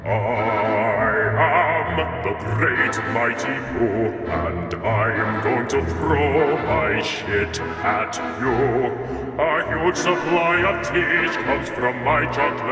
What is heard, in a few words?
poo song; tf; saysounds